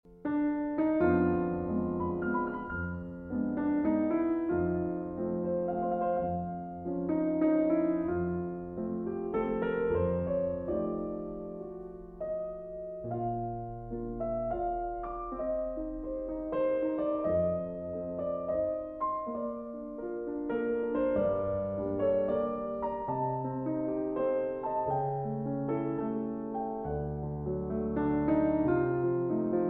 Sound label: Waltz No. 3